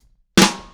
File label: Happy Pub Beat Intro, Indie Rock; Sonic Foundry (Sony Creative Software); Indie Rock Anthems; Session 05 - Happy Pub